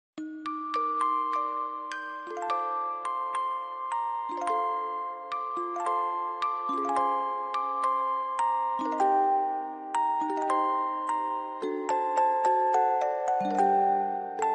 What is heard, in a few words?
music box ver.